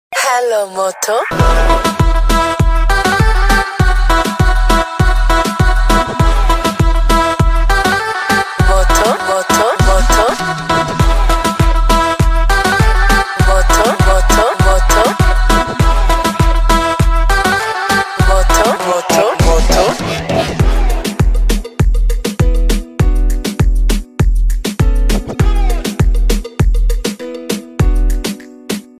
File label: motorola moto g7 plus